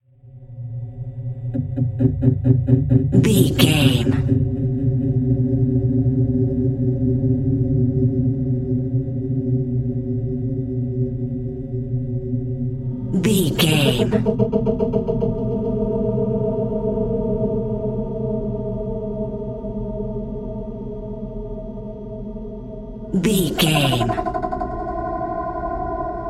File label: dreamy fantasy mystery hypnotic sci-fi